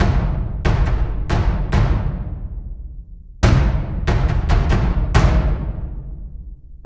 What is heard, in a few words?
DRUM LOOP 135 BPM